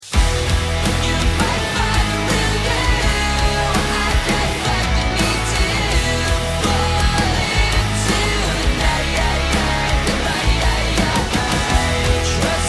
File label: choose team, music